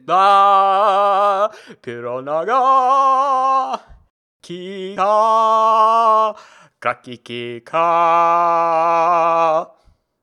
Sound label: sing; Hollow Knight: Silksong